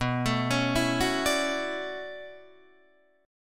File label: B Minor 11th B down Guitar DADGAD; arpeggiated